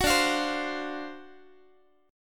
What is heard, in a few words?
D 7th Sharp 9th D down Guitar; D7#9 strummed; 21,22,20,22,x,x; tuning 4A,4E,5A,5D,5G#,6C#